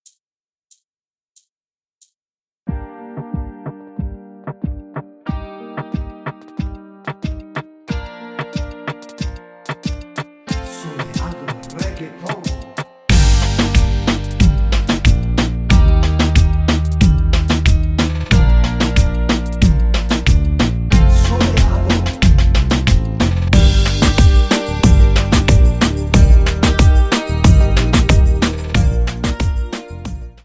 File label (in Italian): reggaeton base